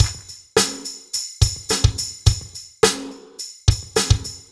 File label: Rock Tweak, Funk Beats; Sonic Foundry (Sony Creative Software); Synchro Funk